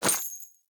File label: Crate Coin Drop; Objects; Pickups; Neathuns